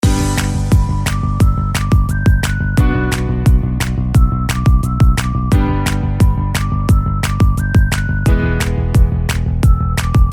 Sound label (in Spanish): musica corporativa